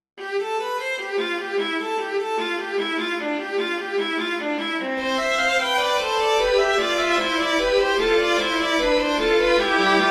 fugue major for string trio; bach johann sebastian

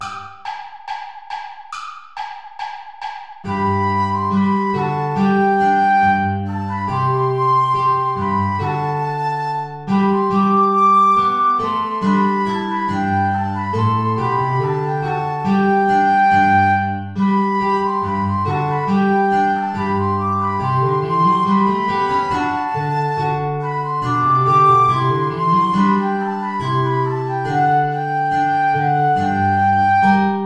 ballet play along without guitar; praetorius michael

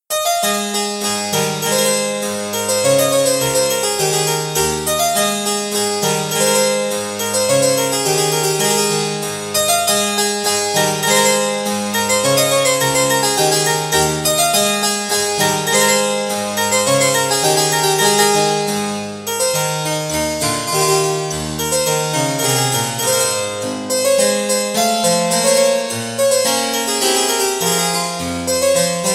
country dance; the catherine

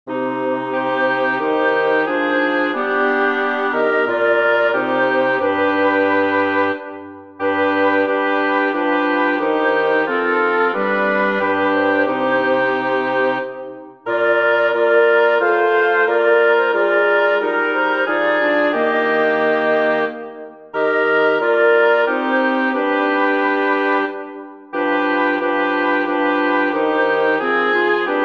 for woodwind quartet; Johann Sebastian Bach; sinfonia from the luke passion

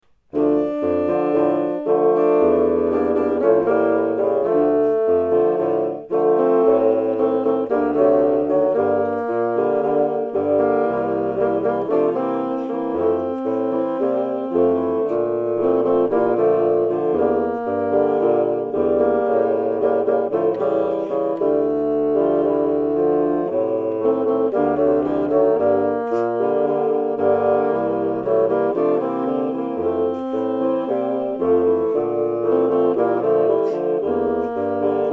jazz latino

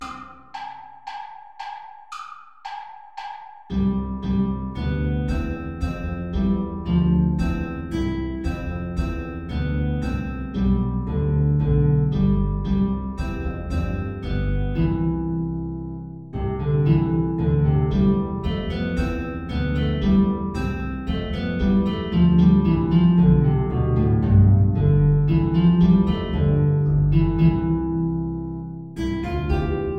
air play along without english horn; bach johann sebastian

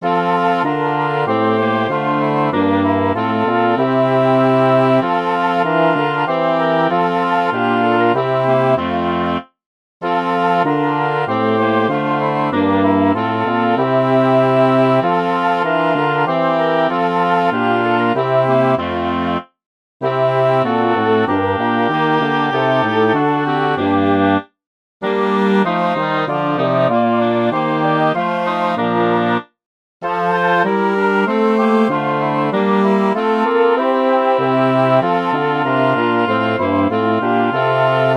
chorale for woodwind quartet; bach johann sebastian; denn wer selig dahin fa hret

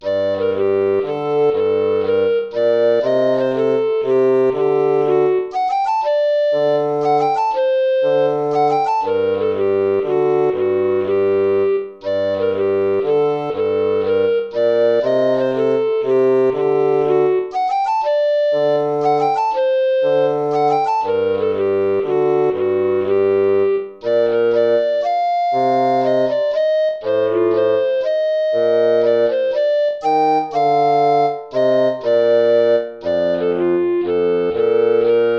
baroque minuet minor

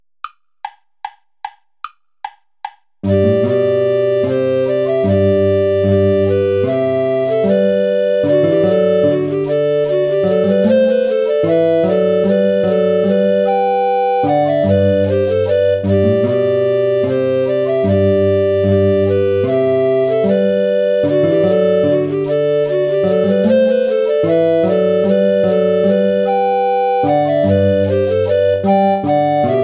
play along without soprano recorder; praetorius michael ballet des baccanales

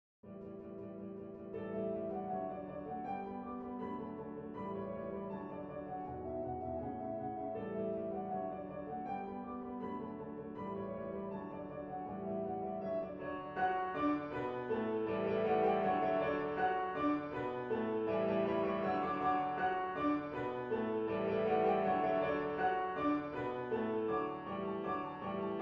french cancan

